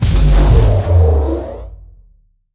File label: Parasite Star Hit; we are the dwarves gamerip 2016